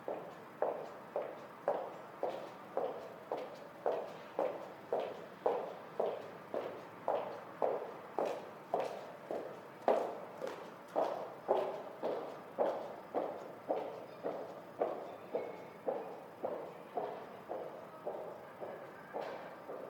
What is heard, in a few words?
Walking